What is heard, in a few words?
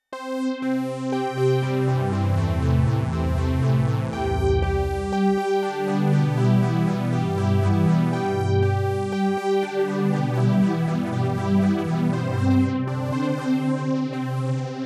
Synth Chorus; NTS-3